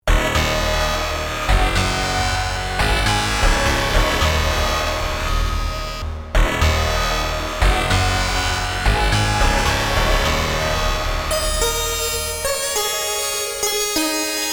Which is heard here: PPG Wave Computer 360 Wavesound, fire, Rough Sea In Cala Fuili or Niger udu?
PPG Wave Computer 360 Wavesound